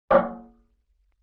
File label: Barrel Hit Medium, Vintage Cartoon; Drums & Percussion